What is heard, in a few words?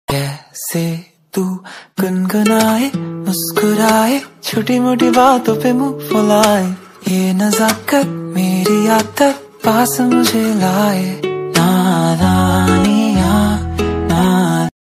love ringtone